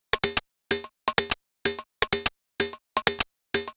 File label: Hihat 127bpm, House Drumloops; 90 s Sample CDs; Zero-G House Fabrik Drumloops; ZG Drumloops